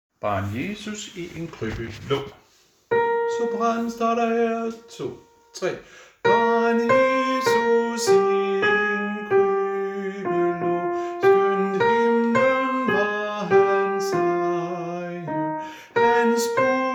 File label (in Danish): sopran; Barn Jesus; harlev koret